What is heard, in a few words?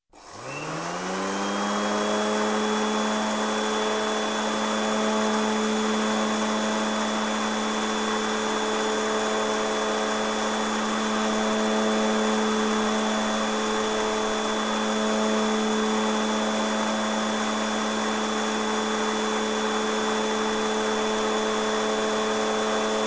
Leaf blower